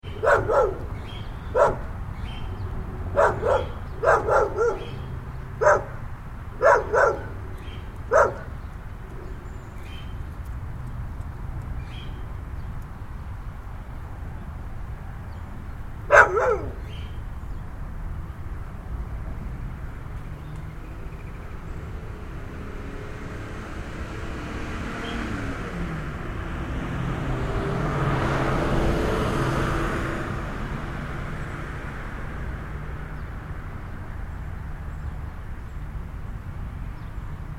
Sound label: Suburban Neighborhood With Dog, Residential area ambience; Dog close up. Dog stops barking after 0:16. Vehicles pass by; City Sound Effects